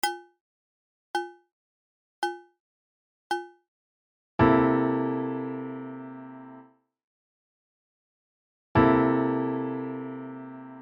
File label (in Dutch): overige akkoorden